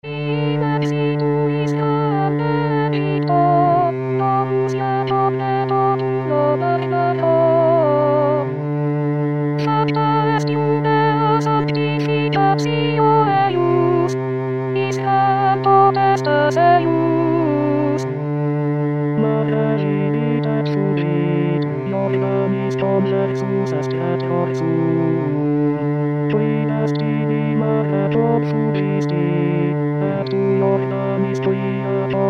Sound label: tutti